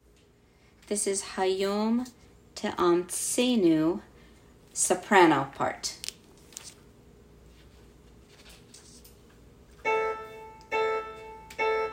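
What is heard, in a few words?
Hayom Soprano; HayomT'am'tzeinu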